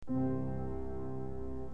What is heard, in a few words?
accord